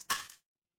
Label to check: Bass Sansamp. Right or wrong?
Wrong